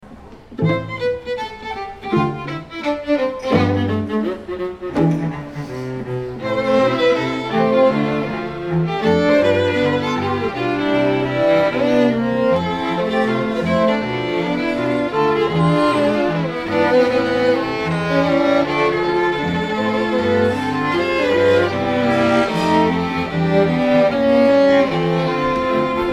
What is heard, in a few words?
strings